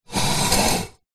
household plant pot ceramic drag on wood laminate floor